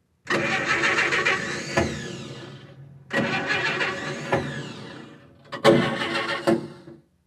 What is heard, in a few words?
Start Truck, Olofonia